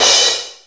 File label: CRASH; DMX Crash 1 of 4; Oberheim drum sound chips